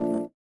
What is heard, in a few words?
VIBRA; Spectrasonics; Metamorphosis